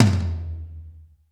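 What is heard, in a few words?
GATE tom; Crypton; DrumBase5000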